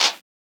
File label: 808 Clap Modulated Hit